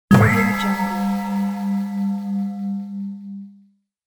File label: scifi soft dazzle bell